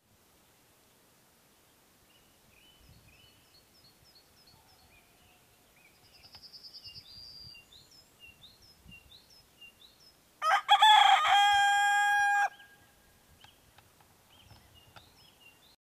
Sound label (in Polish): Odglosy zwierzat kogut